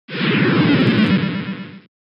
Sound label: Ball Out; 3D Pinball for Windows - Space Cadet